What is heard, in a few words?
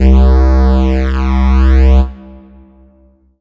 Ensoniq SQ-2 Synth Bass Lead C3